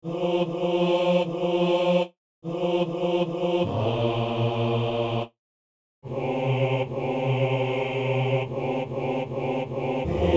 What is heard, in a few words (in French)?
basse